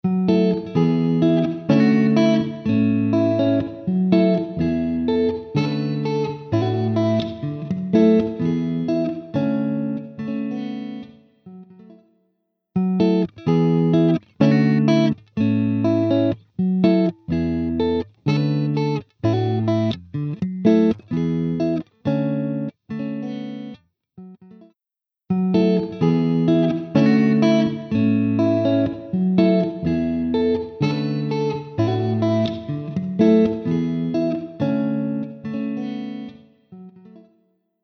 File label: Clean Electric; SP2016